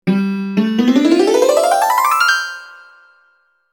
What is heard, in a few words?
blackout dulcimer; anime